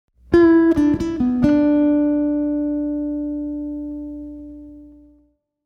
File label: bend phrase, guitar string bending